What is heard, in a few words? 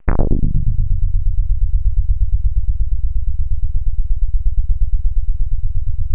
BASS